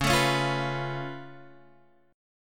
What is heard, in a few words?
C sharp Augmented 7th C sharp down Guitar Half Step Down, chord; C#+7